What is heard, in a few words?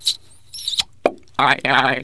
KISS